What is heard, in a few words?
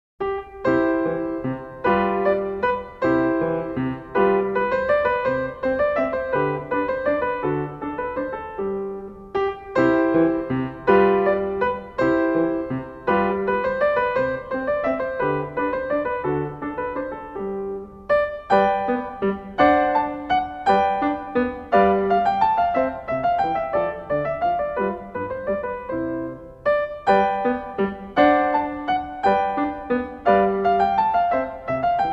German Dance In C Major; Magrath Book 04